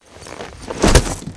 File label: body off console; ambient; Half Life 2; Episode 2; outland